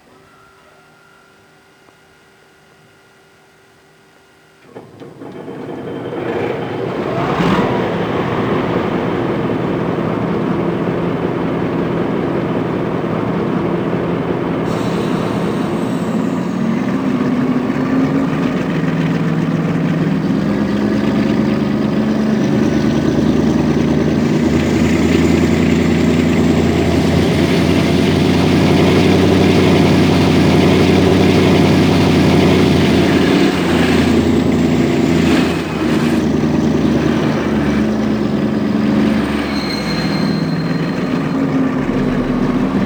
Hoerprobe BR 216 Vorserie

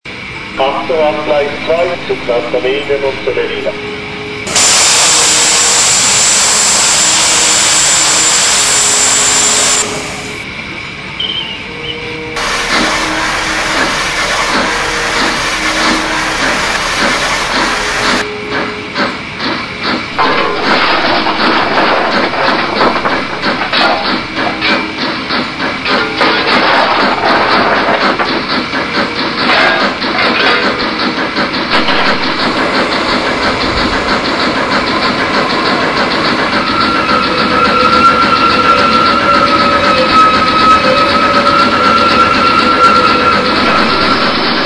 Dampflok G 4 5 Soundmuster mit Vakuumbremse; Hardy vakuumbremse

